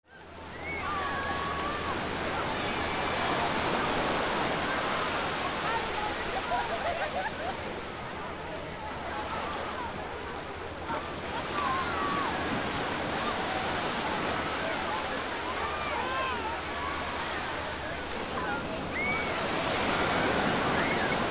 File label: AMBIENCE